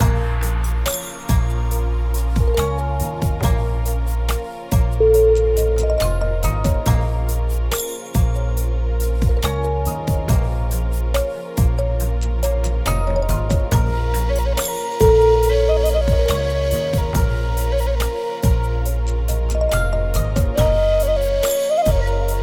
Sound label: asian journey